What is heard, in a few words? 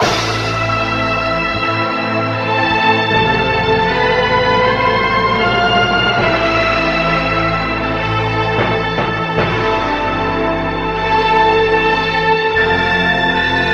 strings, Sad Loops